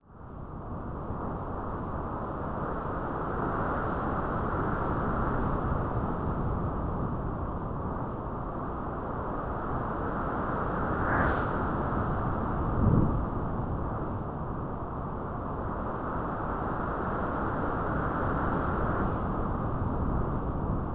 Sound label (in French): vent Froid